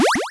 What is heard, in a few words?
land; scrapeboard